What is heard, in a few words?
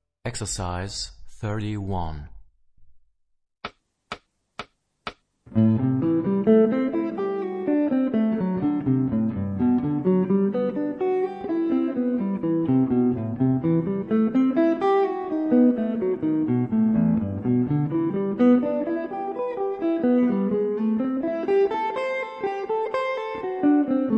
Rock guitar; Rock gt Secrets